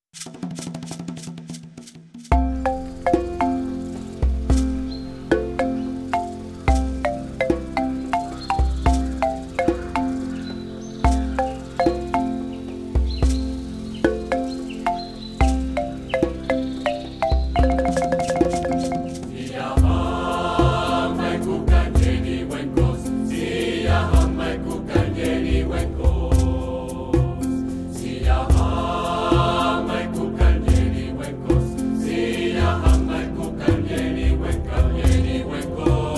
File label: Siyahamba voz